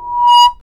FX SWEEP

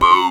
boom; PlatinumPhattCD1; E-MU Producer Series Vol. 8 – Platinum Phatt; Phatt Combo

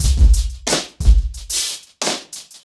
90BPM, acoustic drums; The Roots Drumloops

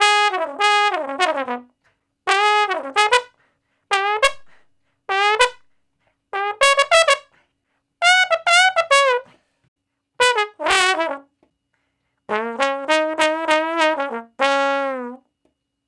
Trombone Straight (Db); Sonic Foundry (Sony Creative Software); Horncraft for R&B; Solos

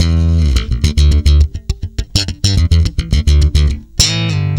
E, tweaky, bass; Spectrasonics; Bass Legends

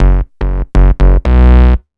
BAS, 2 Step; USB Soundscan vol.51; House Side Of 2 Step; AKAI

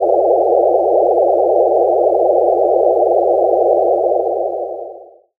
Classic FX; radiosonics; Classic Simple OSC FX

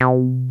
303 RESO; Pro-Rec - Synthsonic

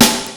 Snare, Fairlight CMI III

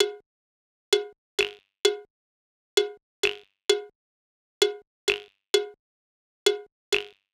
Cb-Fx Loop 130, Perc Loops 130, Loungin House; Equipped Music

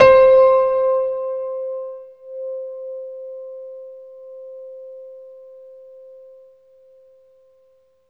PNO C5; East West; Phat & Phunky

